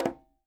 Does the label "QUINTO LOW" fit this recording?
Yes